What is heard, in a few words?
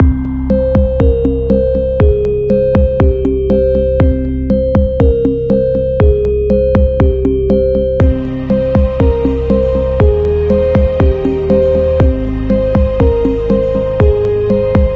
Dark Suspense